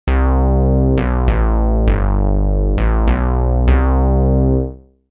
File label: Plasma-Bass piece